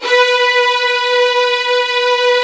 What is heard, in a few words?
VIOLIN; VIOLIN1